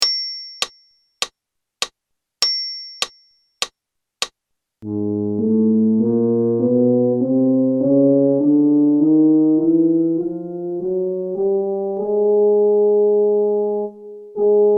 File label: Chromatic in Bb count in, brass, practice